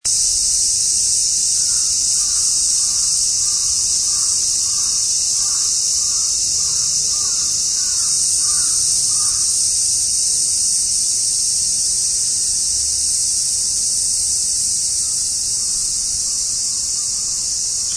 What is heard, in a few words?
cicada